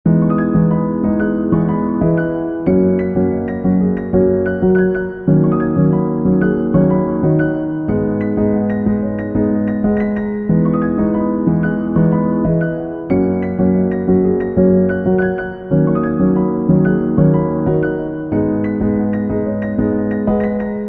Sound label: Loop Felt Piano